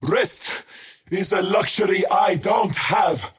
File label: skywrath crown move pain; skywrath mage